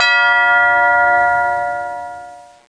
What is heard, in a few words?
Chime; Death Blade